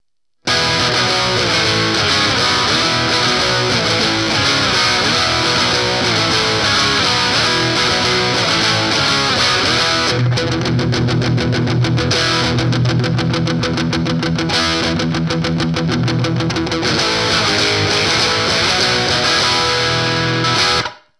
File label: amp soldano